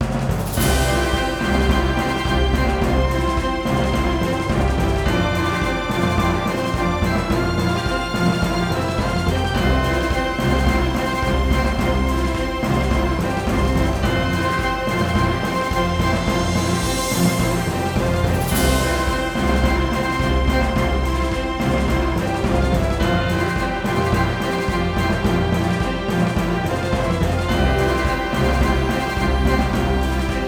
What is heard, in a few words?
First Victory